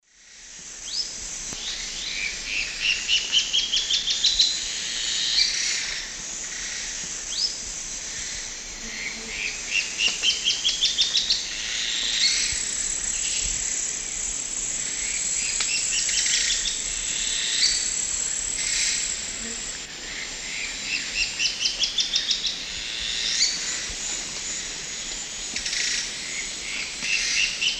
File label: Thamnomanes ardesiacus, song male, S. America Eastern Lowlands